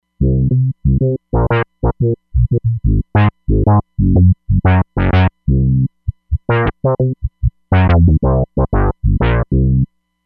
SF Bass, Roland Fantom XR

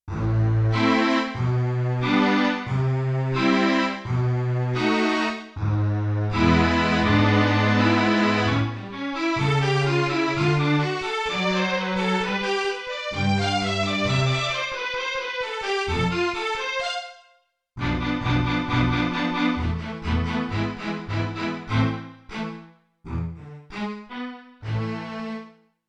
Bowed Strings, Yamaha TX16W

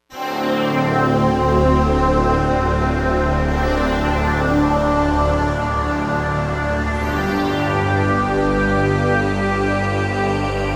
Take off

